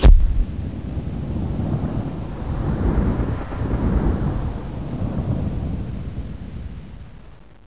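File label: shockwave; duke nukem total meltdown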